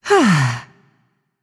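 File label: sigh, female voice; smite; voicepack; hera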